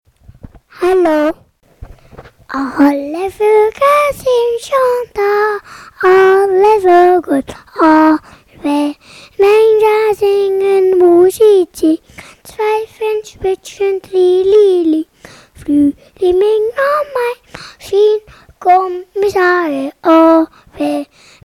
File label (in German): alle voegel